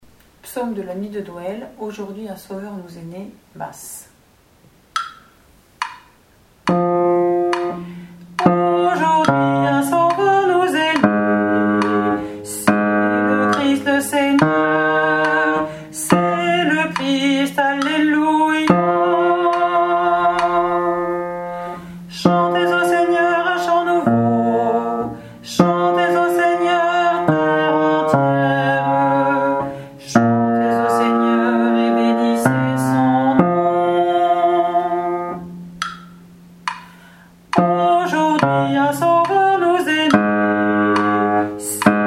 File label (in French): Basse; Psaume 95 Nuit de Noël